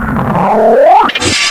hunter prestrike, ministrider

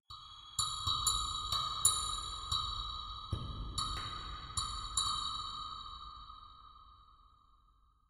ambient synth grain click

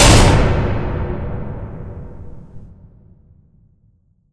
crusher impact; Source Engine; Portal 2

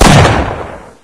s barrel, weapons; Source Engine; bshift

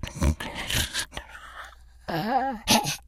breathing, infected; npc; idle; source engine; left 4 dead 2

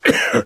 cough, survivor voice; source engine; left 4 dead 2; namvet

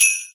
xmas sleigh bells

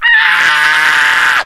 rage at victim, infected, male

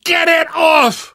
scream while pounced, survivor voice

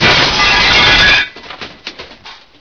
bust glass, debris; source engine; hl1; video games

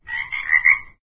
frog, frog groups; ambient; random animals; Left 4 Dead 2; Source Engine

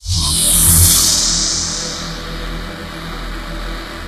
fizzler start; Source Engine; Portal 2

